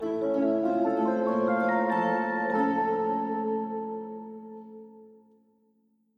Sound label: Sleep jingle